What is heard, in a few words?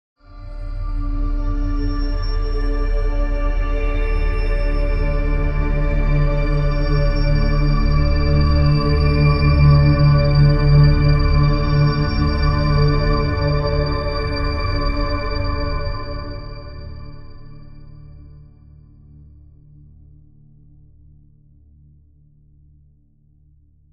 overtone